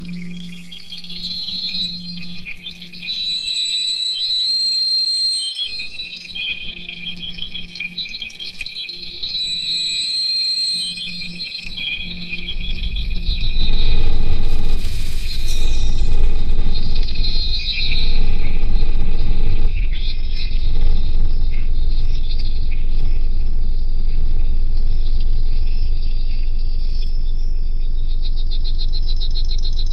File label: ambient nature